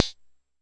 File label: Jomox MBrane, drum machines; Jomox MBrane 11